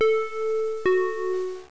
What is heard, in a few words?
doorbell